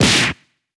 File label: punch